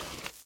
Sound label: reel Bounce; lost relics